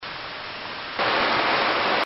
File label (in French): bruit cargo